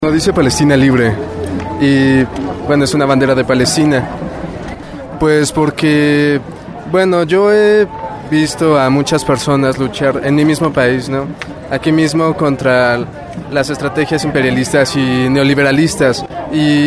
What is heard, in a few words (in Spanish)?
marcha; palestina julio 2014; nos también somos valientes